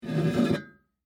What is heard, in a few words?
Pot Pick Up, Household, From, Wood, Full, Small, Metal, Antique